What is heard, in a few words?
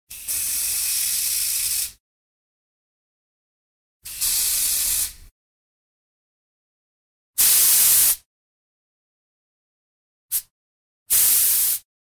Aerosol; 100 sound effects